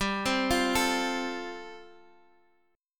G 7th Suspended 2nd Suspended 4th G, Guitar Half Step Down